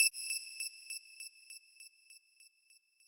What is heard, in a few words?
lead